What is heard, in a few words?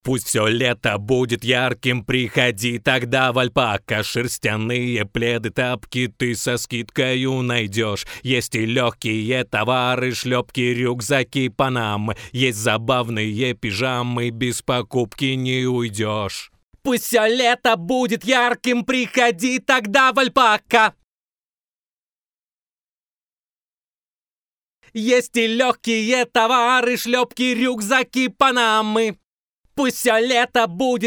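vocal; Alpaka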